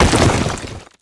Stone Destroyed, Stone Blocks; fowl

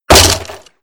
Halloween Extras Destroyed; fowl; Halloween Blocks

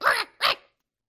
Bird Red Selected; fowl

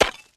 Ice Collision, Ice Blocks; fowl

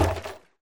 Wood Collision; fowl

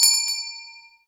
Christmas Bell Collision; fowl; Christmas Bell Blocks

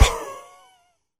Pigs Destroyed, King And Helmet; fowl; Pigs Set3

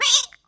Bird Damage; fowl

